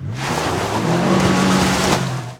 Auto Tires Peelout Mud; Automobiles

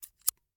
FOLEY Scissors Cut Air; mono; Universal Sound FX

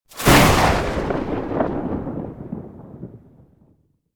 Thunder Clap - Heavy Crack; Hollywood Edge; sound designer's toolkit v2

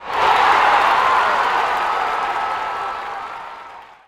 BMX crowd cheer small; Crowds

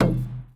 Street Ball Wood Back; SFX Kit; Street Basketball

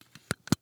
Needle Close Cap; Medical; Blastwave

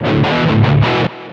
Pickup Guitar Riff; Pickups

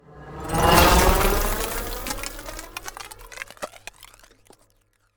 Ice Spell Whoosh Ice Rain, Cold Whooshes; Arctic Aura

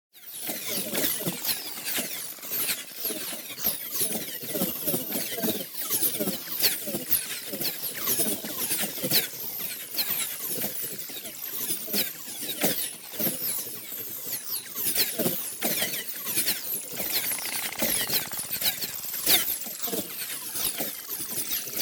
PARTICLES SMALL FAST Micro Blasts; boom library; Neon

